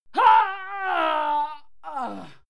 female fight die; schreie; menschen

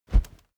whoosh cloth low soft

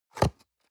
box small open medium

